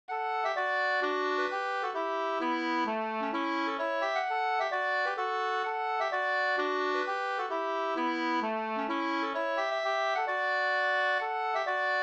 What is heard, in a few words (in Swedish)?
hambopolska